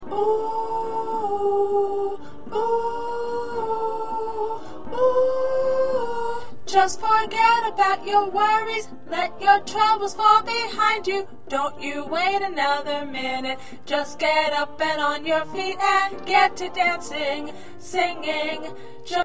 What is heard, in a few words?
1st Alto